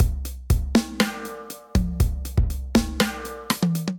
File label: Kit, Drums, Acoustic; Rockhaven Kit